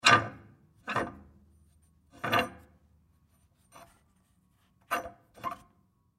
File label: Sewer Valve Crank Turn Wheel Inside Sewer; Factory; Factories; Industry; Blastwave